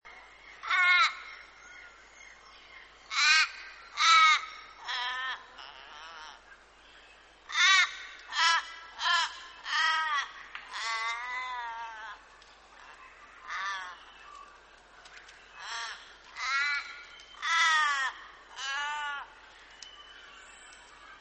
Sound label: Raven; Bird Calls